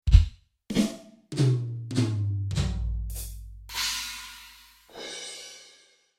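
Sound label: Drum tile; Drumset; gekachelten Zimmer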